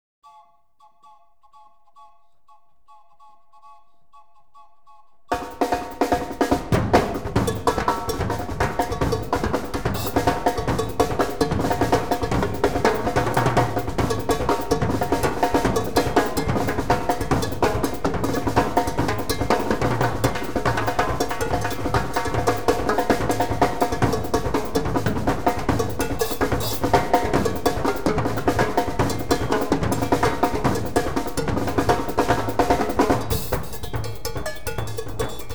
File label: Jam; The Clarity Collection